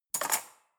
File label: cutlery fork drawer handle